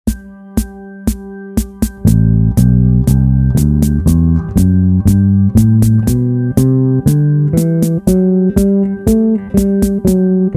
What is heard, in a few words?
Dorian Mode, bass; Dorian groove in A; Jazz musicians frequently substitute Dorian mode for the minor scale.; a bassist's guide to modes